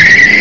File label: skid; dodge em; arcade